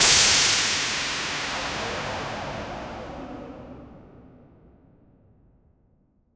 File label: flashes